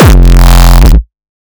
TECHNO EXTREME FULL KICK; TECHNO PARADISE

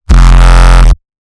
TECHNO EXTREME TAILS; TECHNO PARADISE

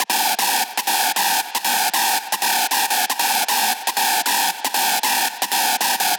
TEKNO SCREECH WET; TEKNO PARADISE; NS AUDIO

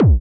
Kick C

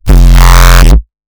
TECHNO EXTREME TAILS; TECHNO PARADISE

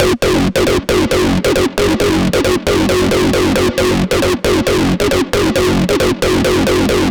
TECHNO ACID LOOP F 130BPM; TECHNO PARADISE

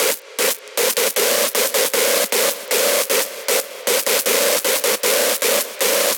TEKNO SCREECH WET; TEKNO PARADISE; NS AUDIO

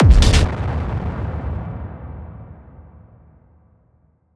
TECHNO SCHRANZ KICK; TECHNO PARADISE

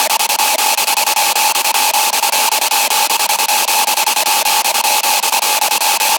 TEKNO SCREECH WET; TEKNO PARADISE; NS AUDIO

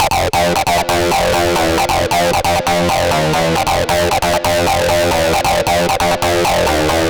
TECHNO ACID LOOP F 130BPM; TECHNO PARADISE

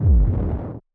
TECHNO TRIPLET TAILS; TECHNO PARADISE

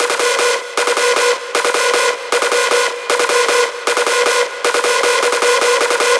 TEKNO SCREECH WET; TEKNO PARADISE; NS AUDIO

